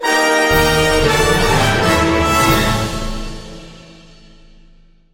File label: victory; star wars rebels team tactis